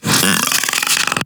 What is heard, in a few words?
Infested Splitter Split Top Vocal Attack Medium; Warframe; Grey Strain